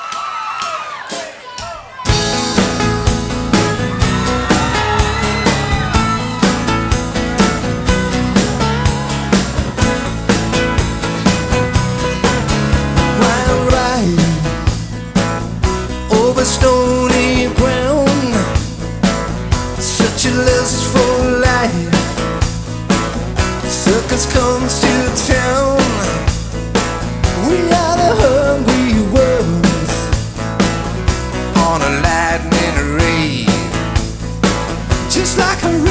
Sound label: acoustic version